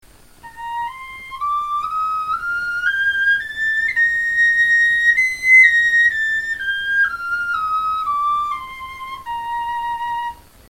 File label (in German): tonleiter, knochenfloeten